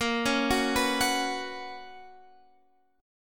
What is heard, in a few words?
B Major 7th Suspended 2nd, chord